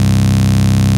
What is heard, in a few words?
Basses Root C; Vengeance Samples